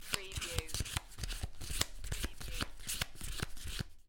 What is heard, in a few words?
Playing Cards Counting Cards